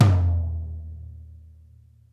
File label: Interactive Drum Kits L; Sonic Reality; 90s sample cds